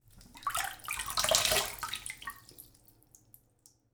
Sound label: Human Male Washes Face In Sink Water Drips